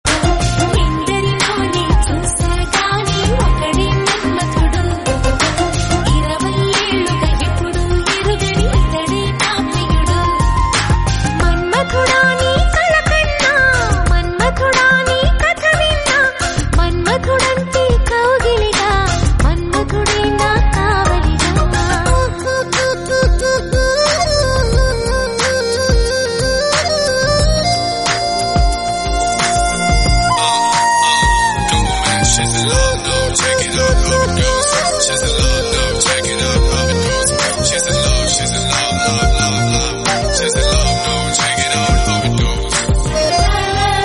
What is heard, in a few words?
music tone; Manmadhudu; Religious